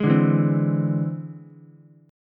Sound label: E Augmented 7th E down Ukulele; E+7 strummed; JGuitar